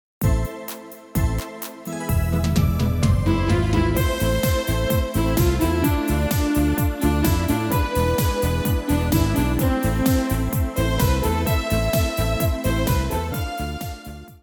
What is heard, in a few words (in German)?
ungarischer Tanz